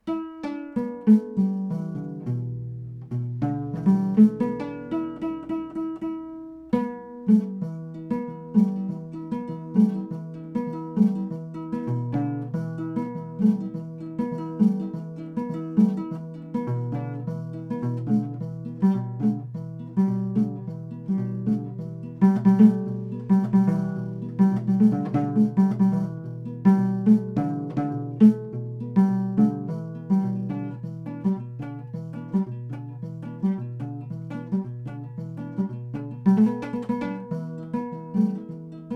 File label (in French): ngoni huit cordes grave